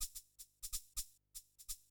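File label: percussion, house, 125; eJay; Drum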